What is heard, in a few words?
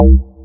Haus Bass; HYPERBITS